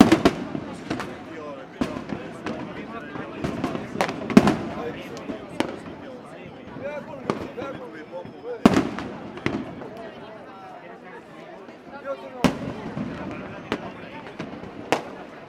fireworks crowd urban; sound effects one

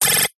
science fiction computer data burst

